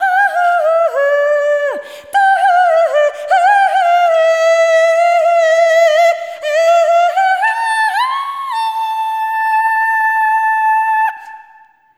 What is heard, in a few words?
Arrhythmic G; Sonic Foundry (Sony Creative Software); ILONA